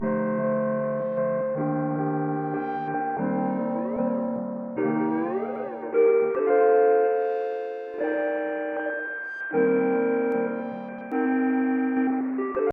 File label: Desi HipHop Type Beat; Bhangra Bollywood Desi HipHop Instrumentals